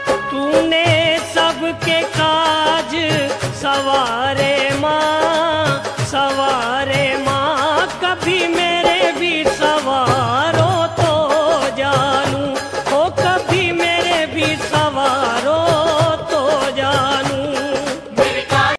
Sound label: Bhakti Song Ringtone; Jai Mata Di